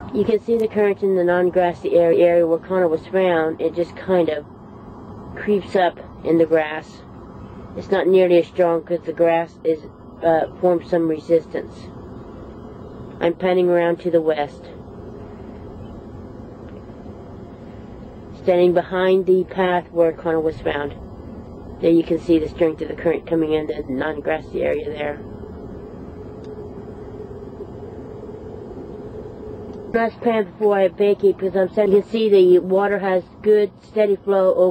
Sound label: jetty